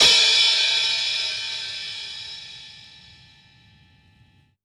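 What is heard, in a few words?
Vinyl Open Hat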